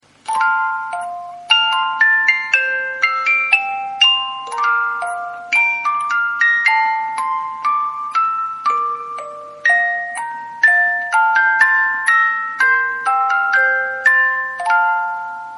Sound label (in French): extrait boite musicale